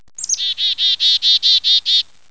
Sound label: bird; ani